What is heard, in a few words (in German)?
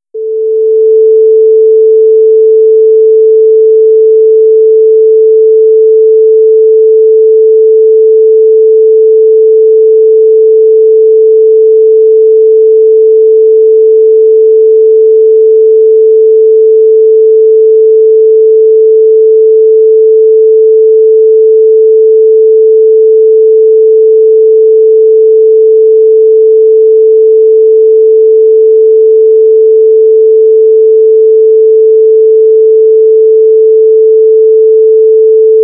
Kammerton A